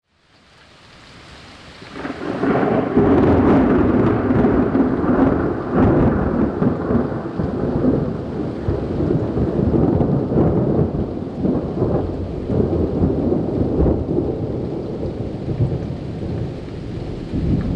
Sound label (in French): orage tonnerre